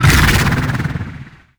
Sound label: death coil cast; weapons; dota; abaddon